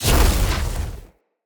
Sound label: Casting Device Impact; Weapon Impacts; Casting Devices; Fenn